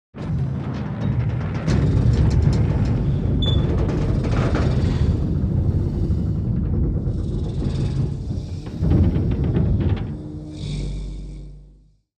The garage door opens, closes

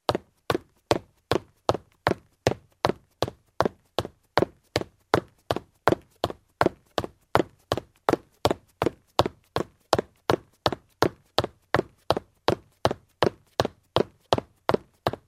Running on a wooden floor in shoes; steps

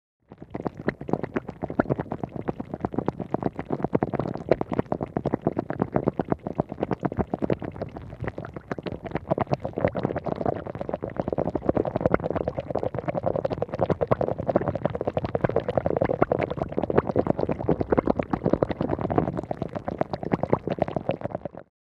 Bubbles in the water

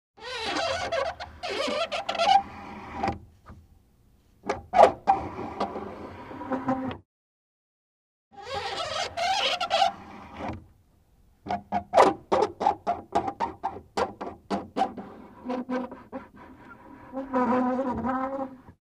Wooden window open, close with a creak; windows

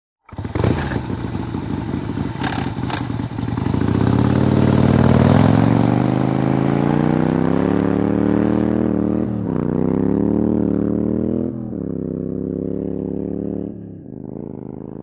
Motorcycle starts, waits, drives away

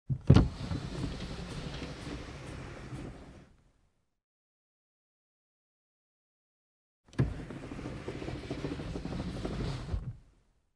Car glass lowered, raised manually

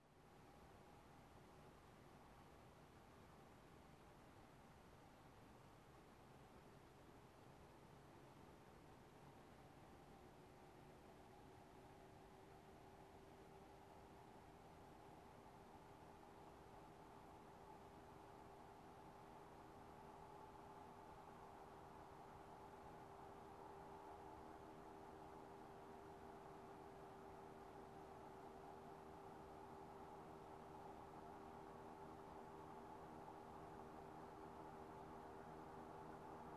Motorcycle approaching, turning off

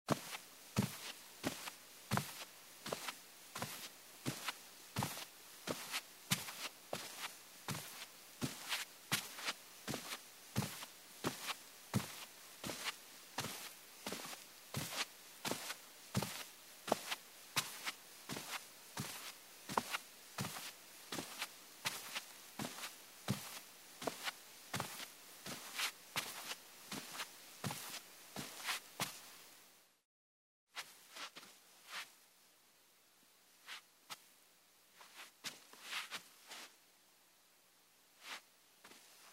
Barefoot steps on carpeted wooden floor

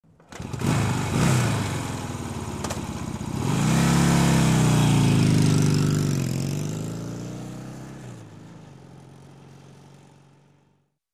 Motorcycle starts, drives away

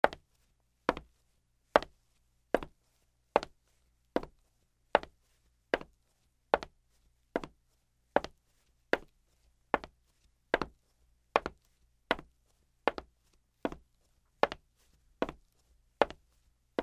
Steps on the wood floor in shoes

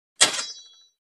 Sword strike; cold weapons

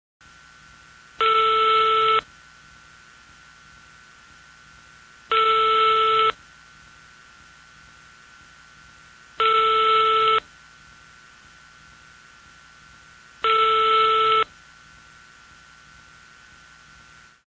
Phone call sound

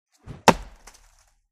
Throwing a battle ax, flying by, hitting a tree; cold weapons

